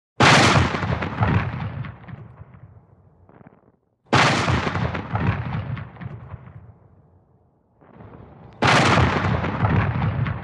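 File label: Artillery Cannon Shot, modern artillery exterior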